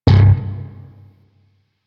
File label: Shawzin Slap Oct One C, Chords; Music; Warframe; Sentient Shawzin